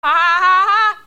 haha aha aha

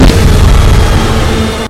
jumpscare sound; five nights at chucks 2

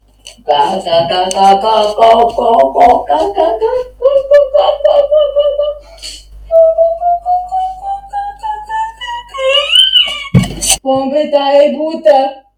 fart car

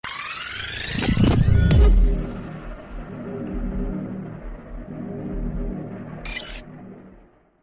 swipe; predator

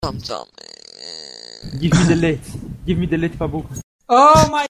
funk

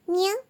meow

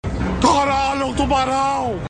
tuba

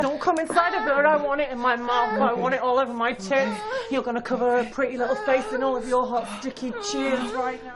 moan dont

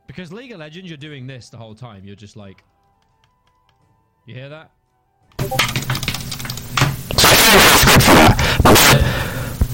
clicks

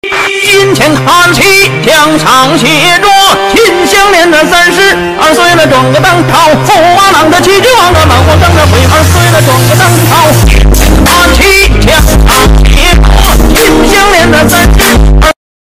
bass boosted

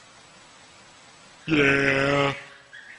goat yeaah

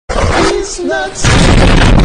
hehejhaaa

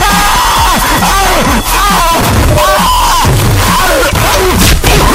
loudest screaming

